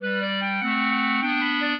clarinet; Mozart

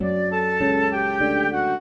trio, flute harp; Mozart

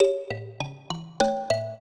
trio, mbira; Mozart

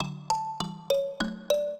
minuet, mbira; Mozart

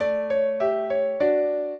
minuet, piano; Mozart

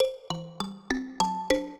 trio, mbira; Mozart

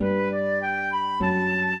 minuet, flute harp; Mozart

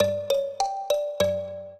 minuet, mbira; Mozart

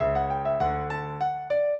minuet, piano; Mozart

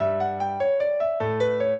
piano; Mozart

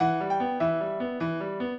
piano; Mozart; minuet

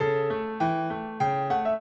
trio, piano; Mozart

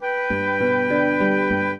trio, flute harp; Mozart

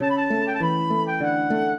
minuet, flute harp; Mozart

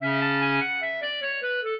clarinet; Mozart

